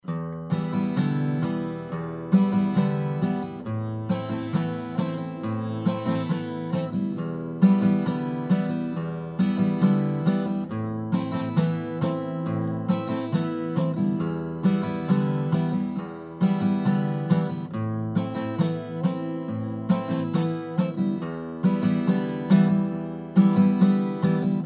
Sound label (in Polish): zwrotka palce, gitara